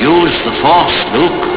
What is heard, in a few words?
mobile polyphonic ringtones